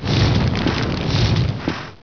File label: foundry, humans buildings; war2